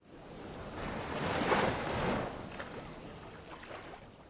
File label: Wave, terrain; Kings